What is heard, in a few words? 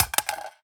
phys mag drop ar poly concrete, magazine drops